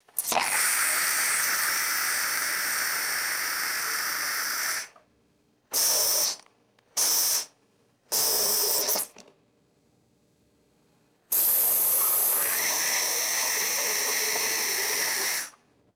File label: almost empty aerosol can sputtering hiss